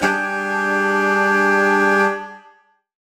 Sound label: Horn Swell Alt Gmin9; undercover samples